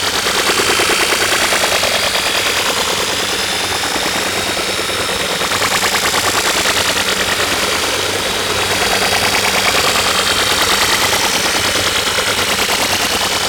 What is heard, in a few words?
Stealth Movement Slow Engine Loop; Warframe; Vehicles; Archwing Stealth